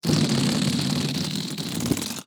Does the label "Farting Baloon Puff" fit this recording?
Yes